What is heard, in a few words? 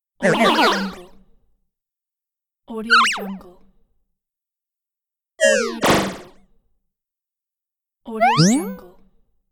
comical ingame